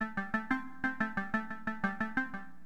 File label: BLIPS, HipHop, Keyboards; LiveAct Pool 1